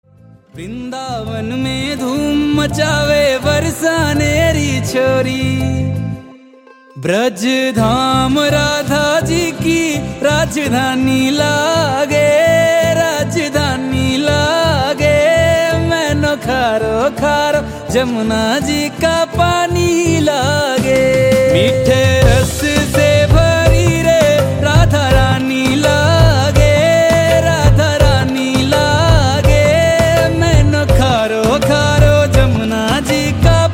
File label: Trending Bhajan; Vrindavan